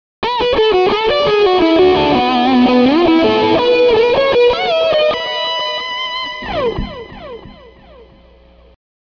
solo delay; pedales

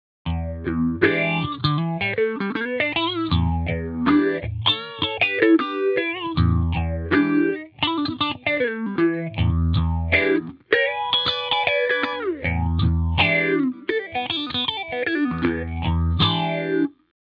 PH350 Rotary Phaser, pedales

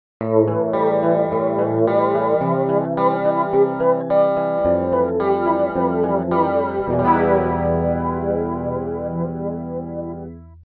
Flange, pedales; Turbo Flange; twisty flange

